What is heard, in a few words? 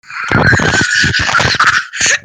laugh